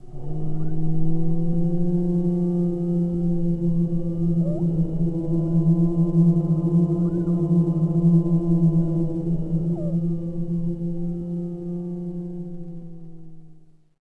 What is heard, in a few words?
Scary Wind; Ambient; Sid Meier's Civilization 4 Complete; Beyond the Sword